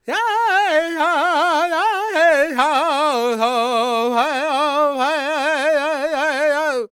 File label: G# MIN, World Voices, LAKOTA; Spectrasonics Vocal Planet CD5